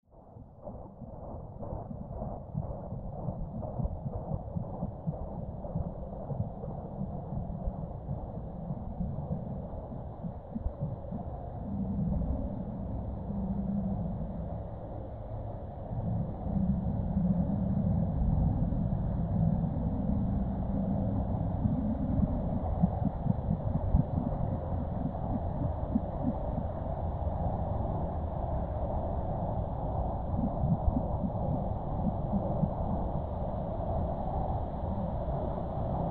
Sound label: sub atmo bounce